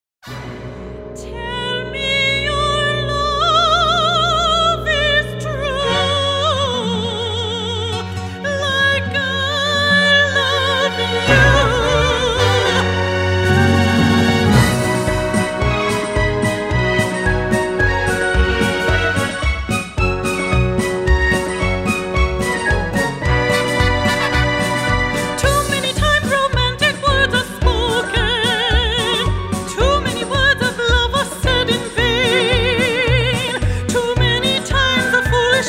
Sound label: Italian singer